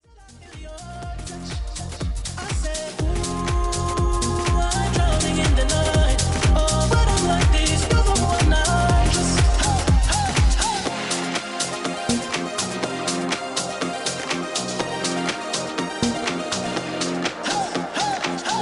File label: disco house